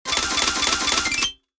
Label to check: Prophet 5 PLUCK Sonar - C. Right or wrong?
Wrong